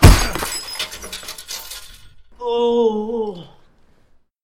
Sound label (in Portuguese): queda e gemido